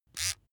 razor small short

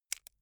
bamboo small break medium

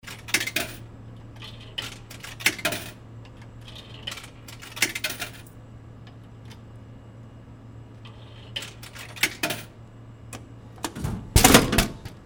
softdrink vending machine

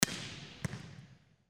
volleyball single serve

